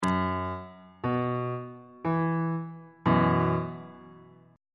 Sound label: f flat suspended 2nd 1st inversion